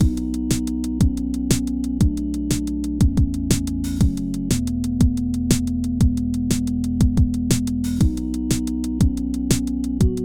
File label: loop 120bps